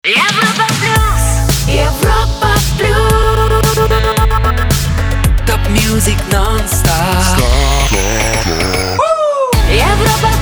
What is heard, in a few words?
Jingle Main ID; Europa Plus